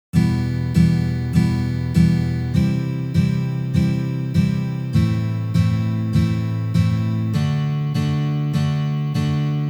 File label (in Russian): Uprazhneniya dlya igry akkorda Mi minor na gitare